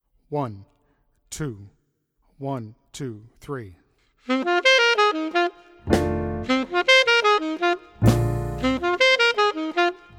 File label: alto sax audio sample